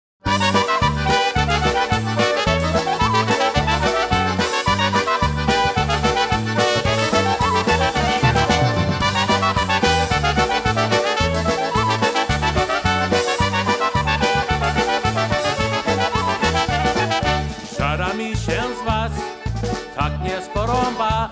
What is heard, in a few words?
Polka Medley